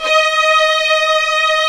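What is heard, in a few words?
strings, Vlns 8 fff Agitato; Roland L-CD702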